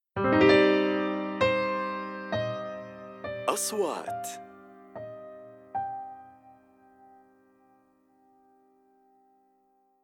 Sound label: intro piano